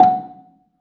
BELA F#4, BELAFON, Asian Traditions; USB Soundscan vol.60; AKAI

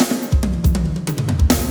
Fills, Loops 140 BPM

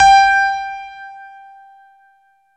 JV E GRAND, JV-1080, PIANO; Syntec; Wall of Sounds

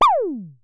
Synare Filter; Star Instruments Synare 3

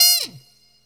Horn Stab

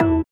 sitar, Indiatek; Spectrasonics; Metamorphosis

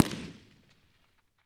PRC DISTA, Guns Tuned; Rhythm Section; Guns & Glass

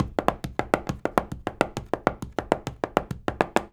133 STEPS, Spanish And Gypsy; Soundscan vol.59; Spanish And Gypsy Traditions; AKAI

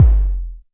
tom, 5U Modular Drums; Polyend; Simulacrum Sounds; 1 Shots; Synths

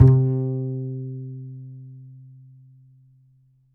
A, Upright Acoustic Bass; Larry Seyer; SWITCHABLE POSITIONS

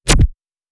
Nice Transient

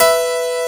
DIDI, SYNTHESIZER, DIGI WAVE

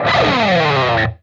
PRS SLIDE, guitar

